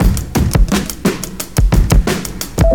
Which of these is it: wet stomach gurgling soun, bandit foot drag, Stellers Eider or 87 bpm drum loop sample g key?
87 bpm drum loop sample g key